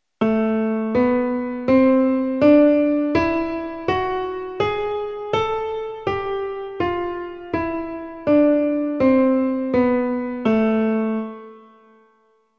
melodica

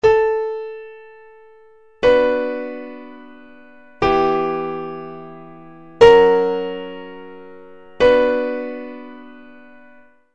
cadencia; sevilla2013

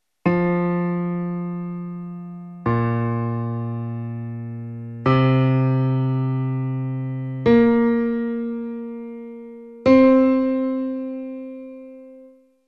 graves fa m

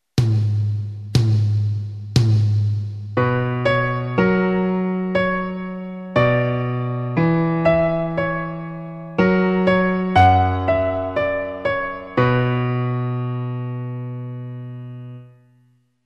2 voces

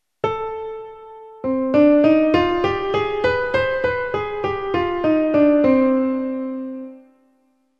escala

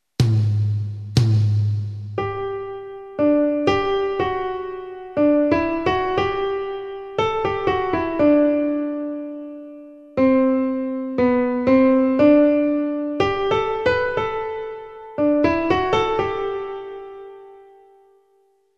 dictado; conservatorio alcaiz

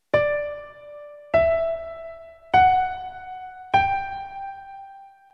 escucha re mi fa sol agudas

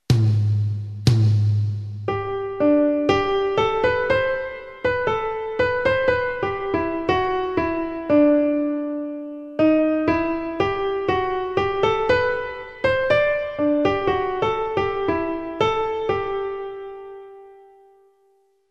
dictado; conservatorio mlaga

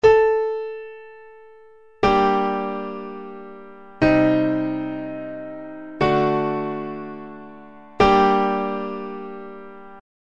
cadencia

